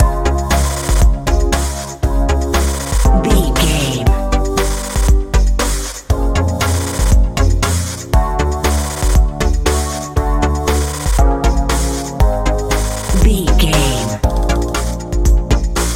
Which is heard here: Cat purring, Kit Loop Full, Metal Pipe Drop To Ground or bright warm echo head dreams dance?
bright warm echo head dreams dance